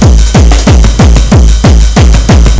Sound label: DRUM LOP; Groovy Bytes: Behind the Moon